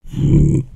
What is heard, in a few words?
Stun; Skullkin; creatures organic